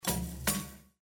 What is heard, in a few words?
Windows Error